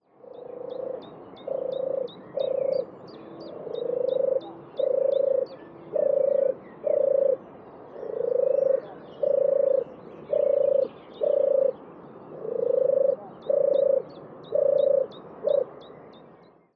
vadgerle Streptopelia turtur

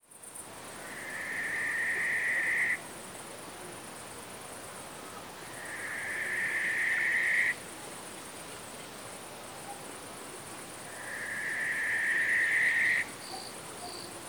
gyongybagoly Tyto alba

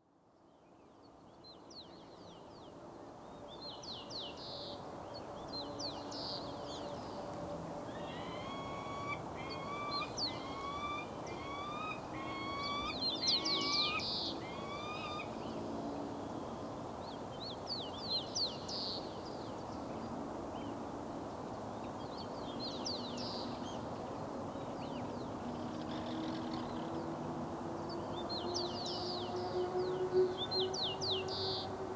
vandorsolyom Falco peregrinus

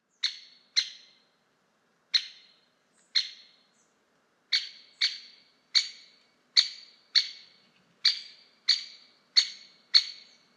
nagy fakopancs Dendrocopos major

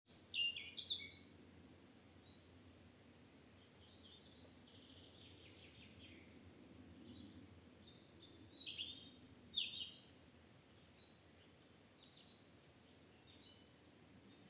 vorosbegy Erithacus rubecula

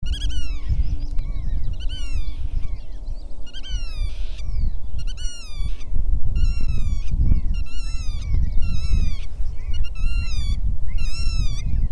nagy goda Limosa limosa

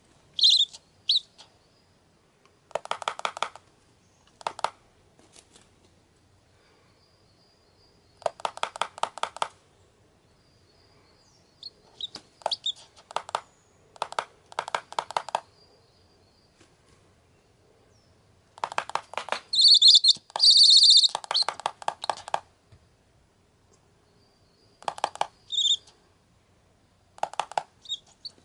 hobagoly Bubo scandiacus

